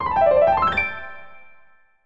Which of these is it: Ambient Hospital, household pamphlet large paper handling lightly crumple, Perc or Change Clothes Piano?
Change Clothes Piano